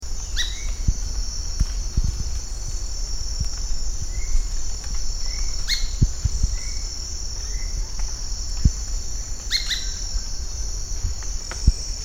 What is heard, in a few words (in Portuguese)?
canto do patinho escuro